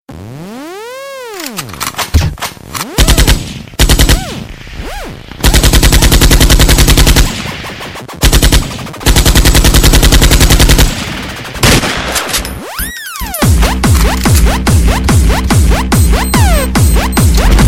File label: New Techno